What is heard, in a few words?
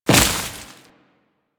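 Step Vegetation, Footsteps; Enemies; Warframe; Grineer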